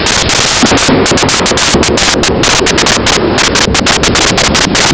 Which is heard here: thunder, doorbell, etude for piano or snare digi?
thunder